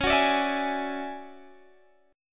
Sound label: D Major Sharp 11th C sharp, chord